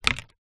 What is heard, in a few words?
leaf dry heavy drop on ground; foley